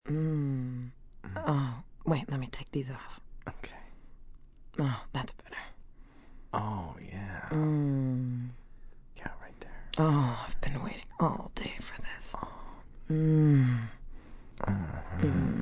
Sex Couple, Sex between consenting adults, couple, start to finish, moans & groans, orgasm; human voice